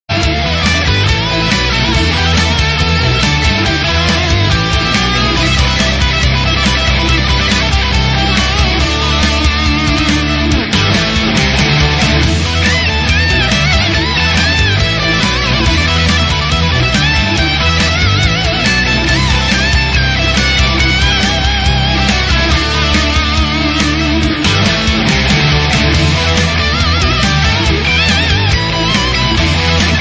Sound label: guitar cover; ACE II